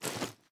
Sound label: pi reload cloth; gmod